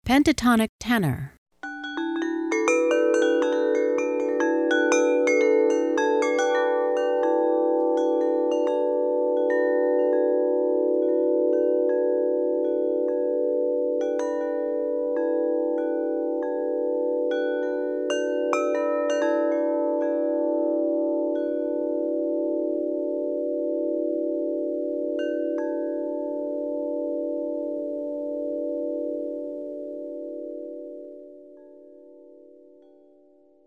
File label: Pentatonic Tenor